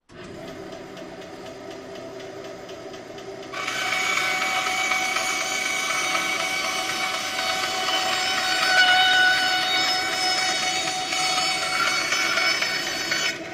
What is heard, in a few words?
Tools, Band Saw, Steady Hand Saw Cutting Through Wood with Back & Fort